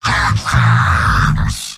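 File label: positive vocalization, male; heavy; tf2; garrysmod; mvm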